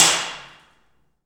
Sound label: PRC ALMWA, Aluminum Wall; Roland L-CD701; FX Perc 1